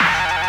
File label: synth, Stabs